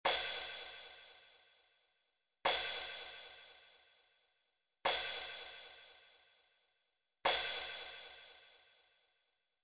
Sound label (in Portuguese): Toque - Prato